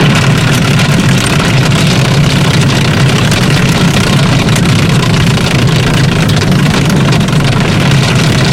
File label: tracks speed, engines tanks; War Thunder